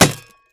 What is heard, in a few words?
hammer impact wood; rust